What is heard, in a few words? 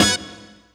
horn hit, Hardcore Breakbeat; Big Fish Audio; Hardcore Breakbeat Action